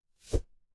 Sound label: transition swoosh